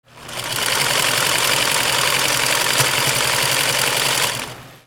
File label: INDUSTRY